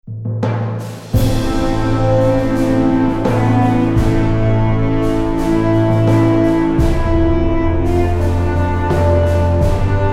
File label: Checkpoint; codename panzers phase one